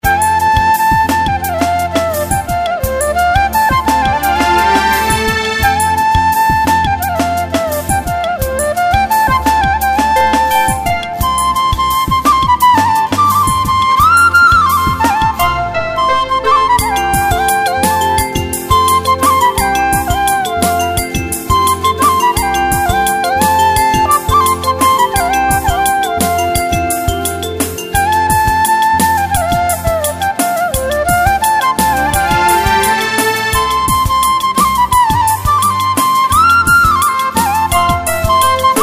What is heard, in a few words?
Flute Ringtone